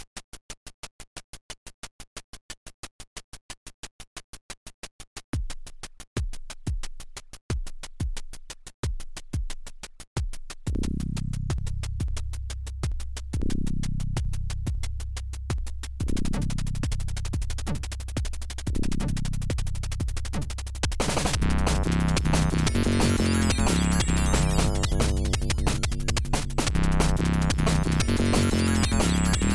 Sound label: dnb; weeklybeats 2026